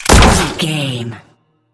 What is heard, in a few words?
gun rifle sniper shot